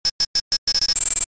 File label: charge, thermal; weapons